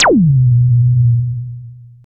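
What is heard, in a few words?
FX left, attacks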